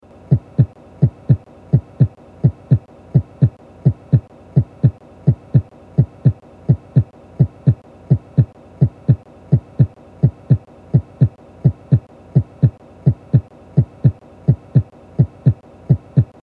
Heartbeat medium - Produced with my boyfriends throat and edited in rhythm